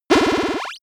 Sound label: arcade super jump